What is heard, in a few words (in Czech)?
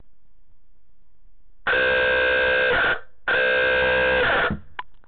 klakson 12v elektromotorovy